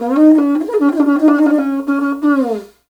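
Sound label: Afro Horns, horn phrase; Af.Horn Phr; Roland LCDP11 Africa VOL-1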